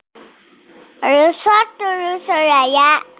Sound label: Baby Cute